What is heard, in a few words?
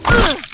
player female damage; draugnorak